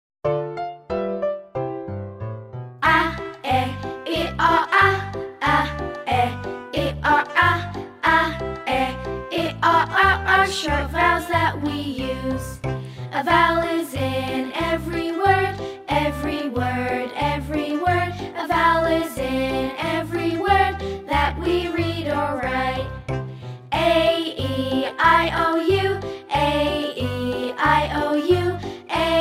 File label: vowels song; 母音の歌; 新訂はじめてのジョリーフォニックス１ ティーチャーズブック